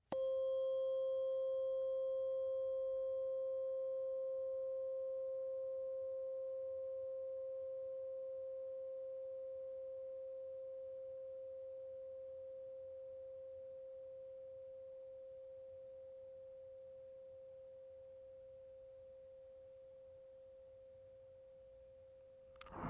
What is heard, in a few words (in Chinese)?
舔耳